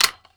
empty, crossbow; weapons; bms

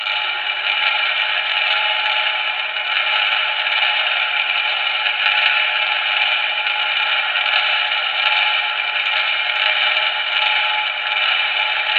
cctv rotate

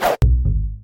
snapback

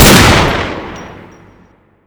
deagle; automag; weapons

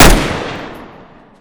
tec9; csgo

